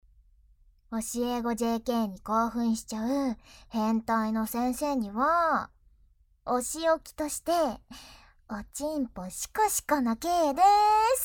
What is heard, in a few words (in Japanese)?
手コキ・耳舐め_ノイズ除去済・効果音なし